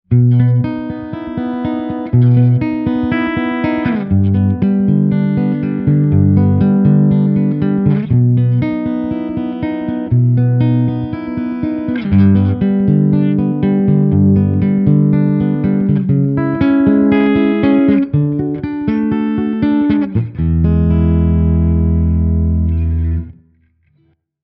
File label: Piezo clean; Fame Forum IV IF